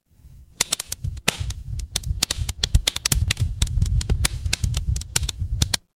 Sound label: fire electric crackle